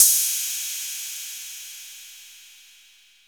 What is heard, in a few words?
Crash